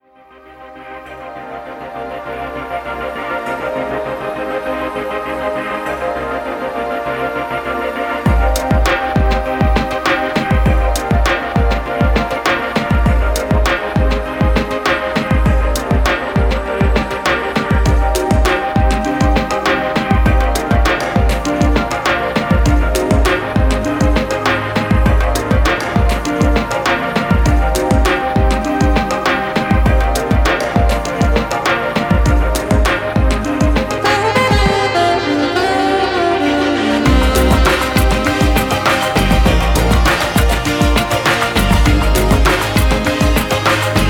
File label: Chillout Cafe